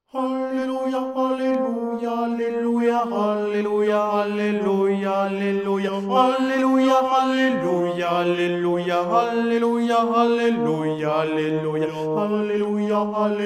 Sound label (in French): Basse; ALLELUIA